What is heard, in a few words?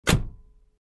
Car Door Close